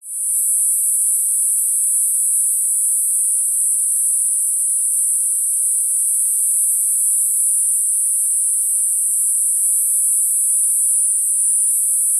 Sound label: animals insects high pitched summer night australia